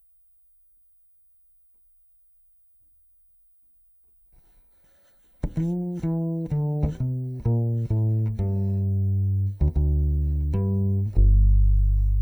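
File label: Contrabass DI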